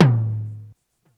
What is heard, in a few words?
Tom Real Long; Lost Tapes From Mars